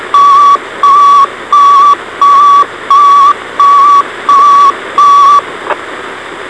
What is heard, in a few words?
911 Fire Toneout